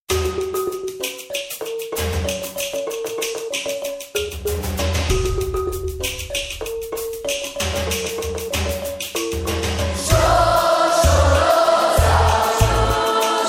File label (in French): afrique sud shosholoza